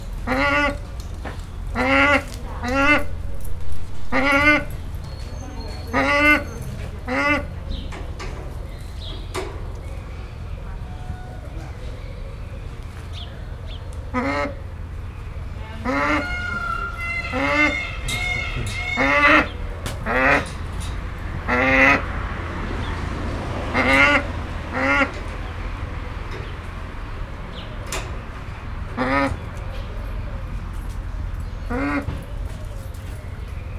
mindig lehet számítani rá, pusztai sas; debreceni zoo 2019

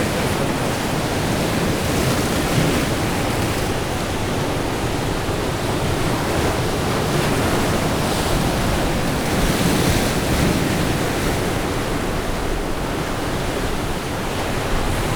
köveknek csapódó hullámok, ocean; orzola

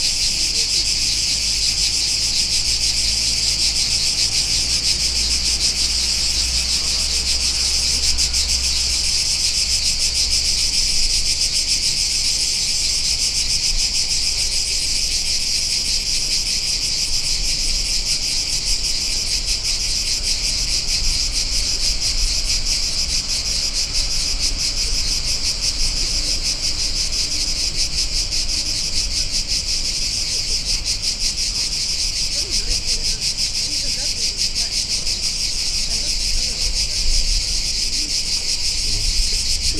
kabocak hatter neszek

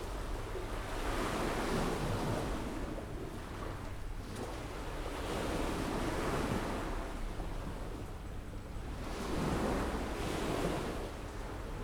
teraszrol, balrol auto, tengerparti hangulat; thassos 2015; skala potamia

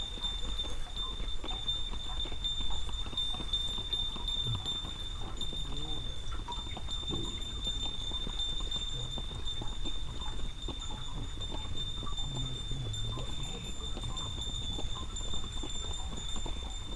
hortobagyi otos fogat, lo; marantz; parasztudvar

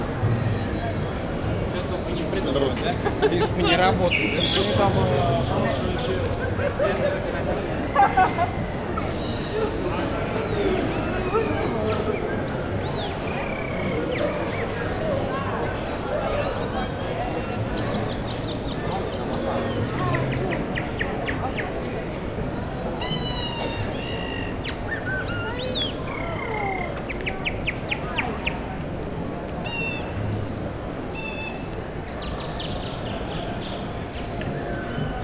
váci utca madárfüttyös ember, éjszaka budapest

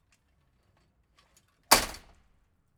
kertikapu

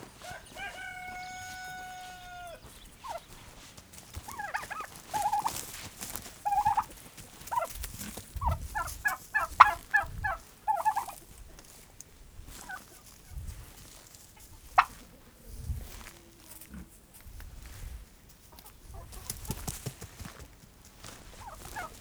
prüntyög, pulykakakas; parasztudvar

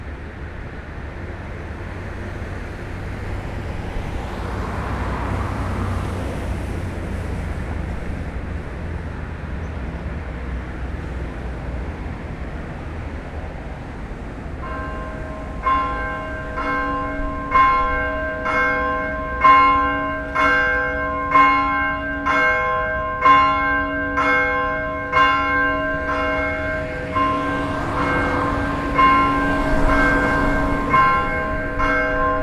pozsonyi úti református, harangozás

